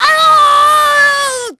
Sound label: falls; VOICE; CARENA; Pulse Racer